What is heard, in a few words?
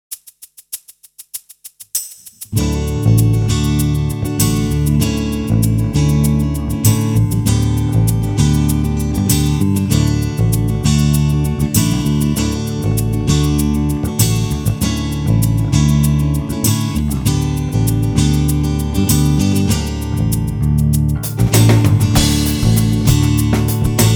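electric guitar to acoustic guitar; ME-80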